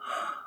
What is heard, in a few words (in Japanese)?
吐息; 吐息/ため息1; 何かやなことがあったんだろうね。